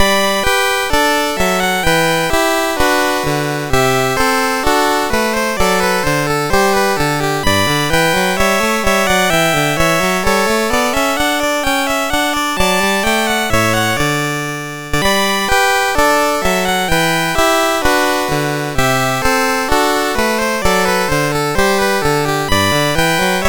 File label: Gavotte, MOS6581R4; Joseph A Grau; Fifth French Suite